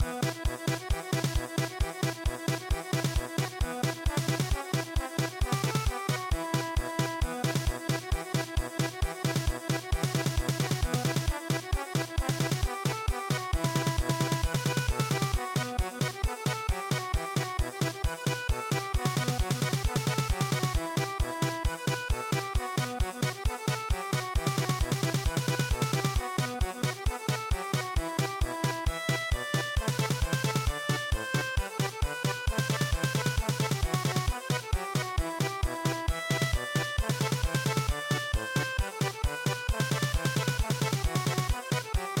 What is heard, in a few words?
Polka German Polka